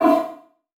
Multimedia Internet CD-Rom Flash Whoosh Short

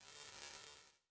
Digital; Adobe; Multimedia Internet CD-Rom Flash

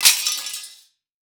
Metal Object Fall On Cement; Crashes

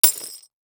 Impact Glass Debris Hit By Small Metal Pole

